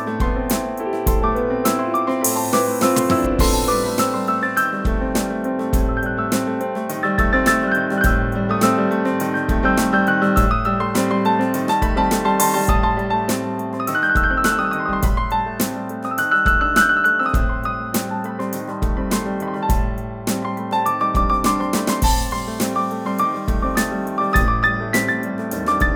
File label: solo Piano